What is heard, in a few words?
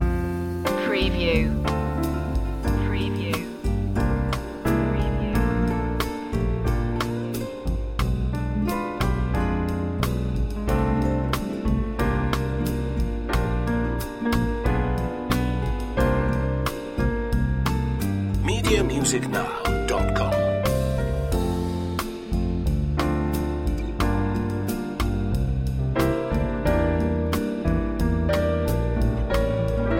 new york bossa nova